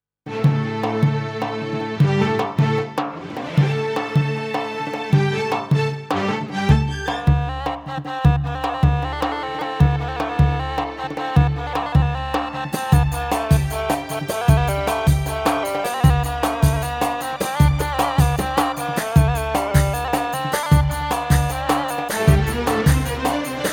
Bandir; Pa2X

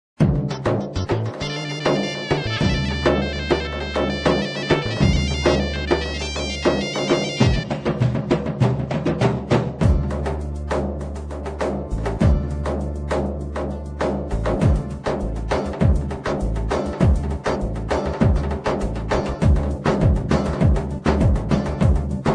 halay, turkish; pa80; korg

